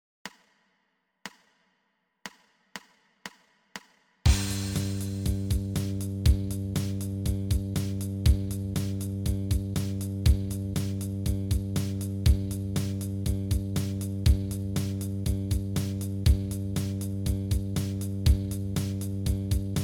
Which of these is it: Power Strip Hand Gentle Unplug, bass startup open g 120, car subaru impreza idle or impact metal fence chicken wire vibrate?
bass startup open g 120